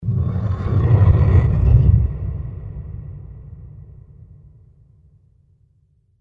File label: whoosh; Страшные звуки для монтажа